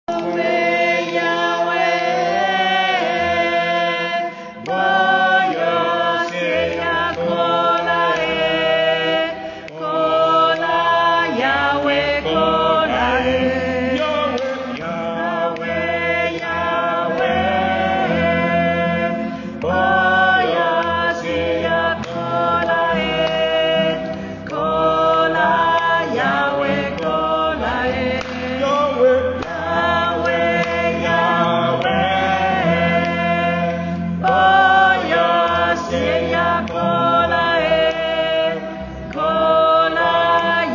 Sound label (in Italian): contralti; Yahwe Kola